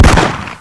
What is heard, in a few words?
rifle; gusanos promode